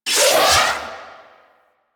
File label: Radial Blind Sword Cast; Warframe; Excalibur